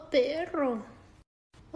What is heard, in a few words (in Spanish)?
a perro